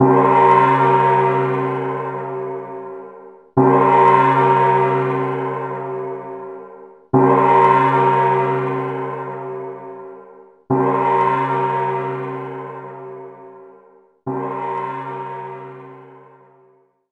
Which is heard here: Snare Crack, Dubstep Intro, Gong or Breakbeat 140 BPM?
Gong